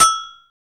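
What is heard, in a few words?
percussion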